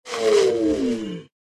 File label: Pet Elemental Light Die